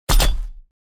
Robot organic cyborg footsteps single step

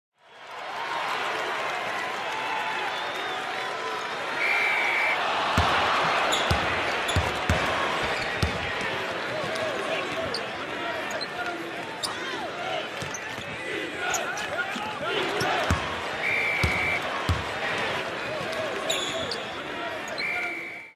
Basketball crowd cheering at a game

